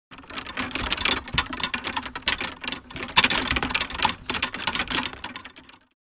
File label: Shaking of tour bag golf clubs movement